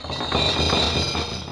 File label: chain